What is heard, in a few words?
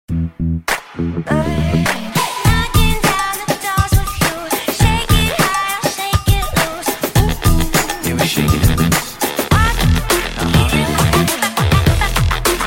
motorola vision ringtone